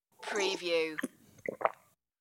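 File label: Sip Swallow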